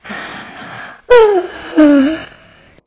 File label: yawn; TomCat